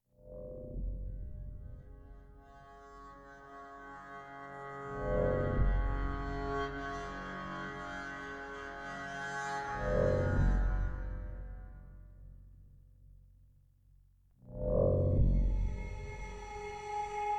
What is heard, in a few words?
hospital ambience; F.E.A.R. 2: Project Origin